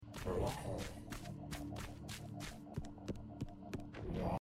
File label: enge walking; risk of rain 2